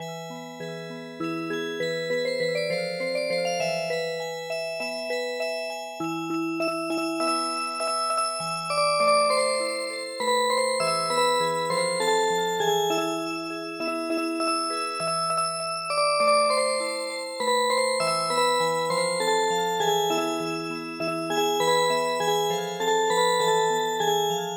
Music Box, traditional; midi

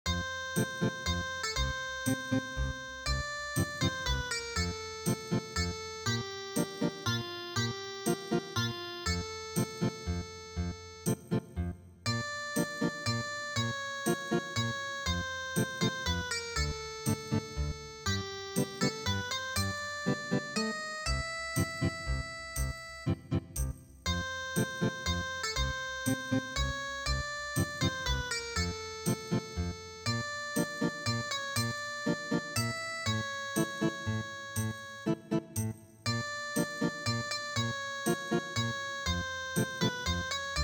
Ethnic Guitar

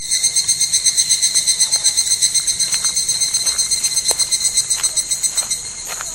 higurashi

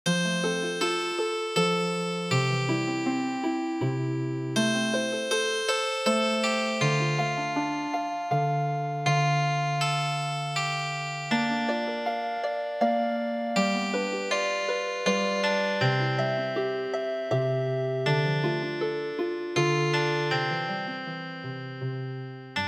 Healing Harp, midi traditional

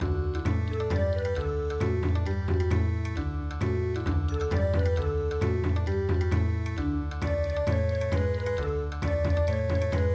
Bon Odori; Elephant trunk

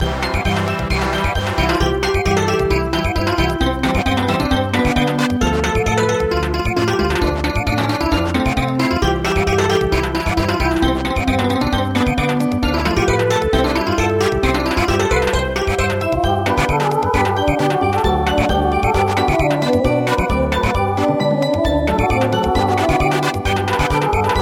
Schottish Samba; midi; traditional

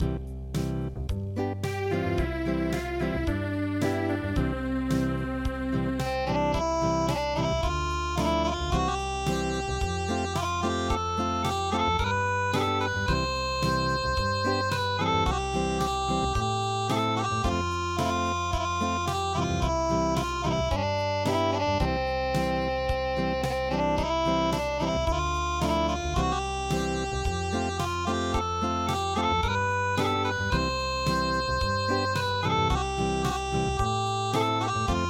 Acoustic, traditional

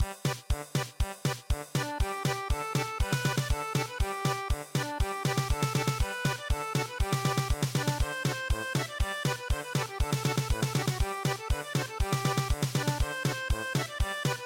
German Polka

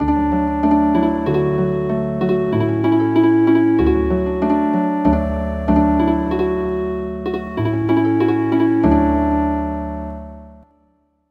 Healin Harp; Well Done

